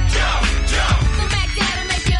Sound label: Jump